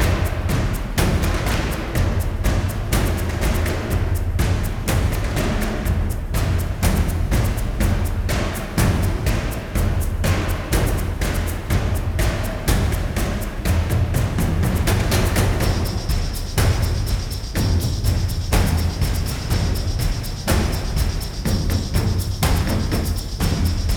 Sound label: Ethereals Combat Loop